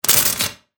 Electrical Arc Zap, High voltage electric sparking and arcing; Electricity sounds